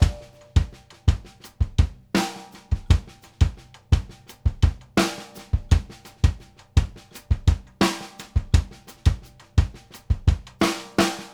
RIM + SNAR, 84; Sampleheads; New York City Drumworks VOL-1; SP ORLNS 84

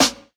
Snare, JUNGLE BREAKS

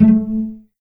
A2 PIZ CEL, string; East West; The Ultimate String Collection

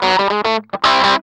GUI, licks; 110 LICKS

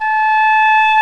WINDS & BRASS

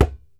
DJEMBE, African Perc

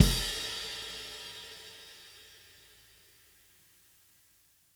Drums Ending, 70s Analog Funk; Sonic Foundry (Sony Creative Software); What it Is! 70s Analog Funk

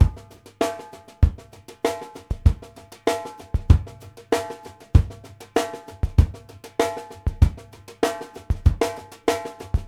STRAIGHT, drumworks; Sampleheads; New York City Drumworks VOL-1

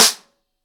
Snare, drums singles; The Roots Drumloops

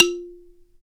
BALA, Balaphone

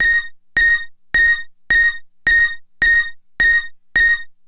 Beeps; Nuclear Sub

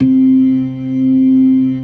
ORGAN, LOWER MANUAL

